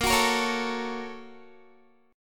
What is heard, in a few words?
Bb Minor Major 7th Sharp 5th, Guitar; BbmM7#5 strummed